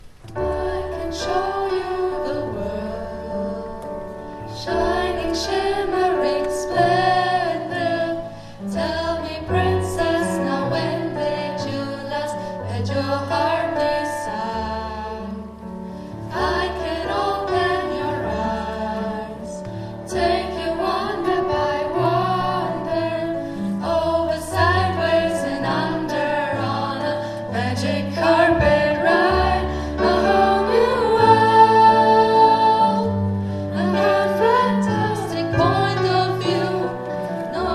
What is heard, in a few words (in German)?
Musicalgruppe; Lengfeld; Konzertmitschnitt vom 2. Juli 2024